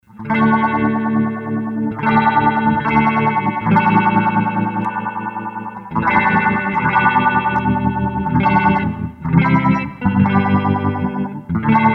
Rotating Hill; Sony HR-GP5

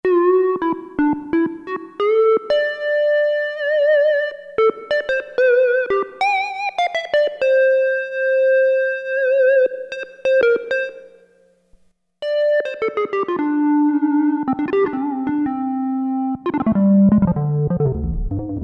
HAMMER LEAD, Roland Jupiter-8; Factory patches

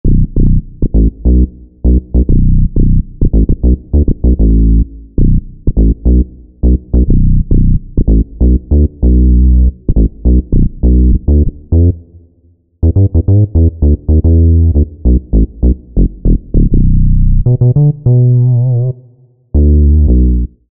E-mu Proteus VX; ATC 3

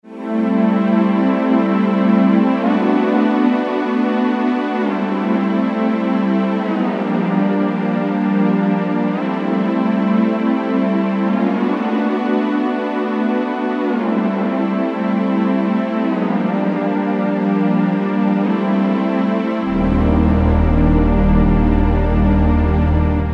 Pad Sound; Generalmusic S2R

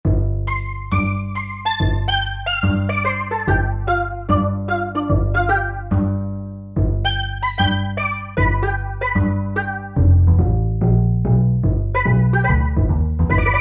Bass ST. Dr, Korg MSC-1S; Korg MSC-1S Variety

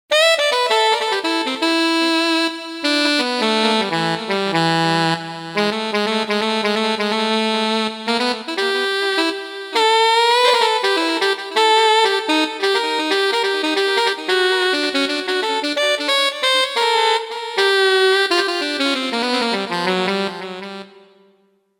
Alto Lead Sax, Roland JV-880; Factory Patches

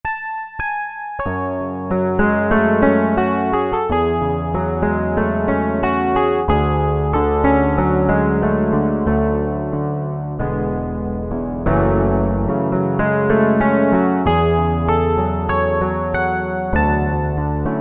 Acoustic Piano, Roland MKS-70

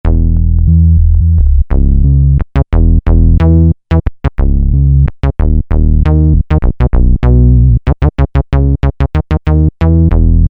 Bass Machine, Korg MS2000; Factory Patches

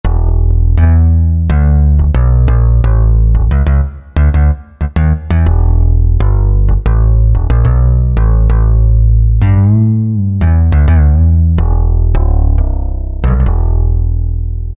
Round Bass, Korg T3

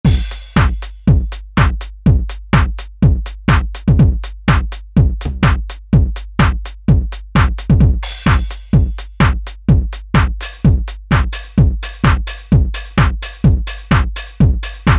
Trance Kit, Alesis Z2 EuroDance

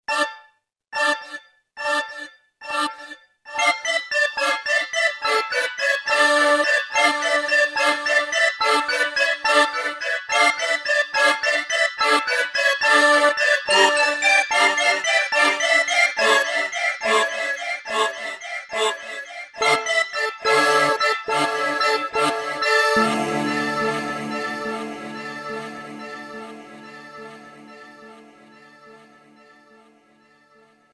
Wet Voices, Kurzweil K2000; SYNTHS; Kurzweil K2000 Value Pack; Extra Programs

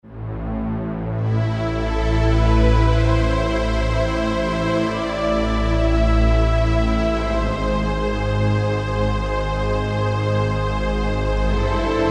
Movie, Yamaha TG500

